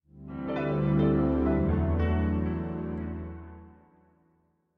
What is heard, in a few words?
Completed; Rocksmith